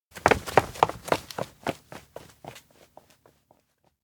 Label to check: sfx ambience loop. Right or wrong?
Wrong